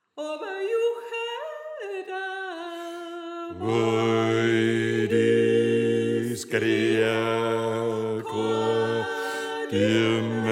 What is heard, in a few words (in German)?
Bass